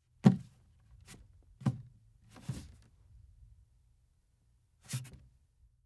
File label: Hand On Desk; Fairlight Sound Lib